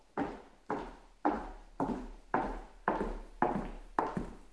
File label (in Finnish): askelkopina